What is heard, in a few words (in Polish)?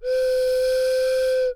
fletnia 44khz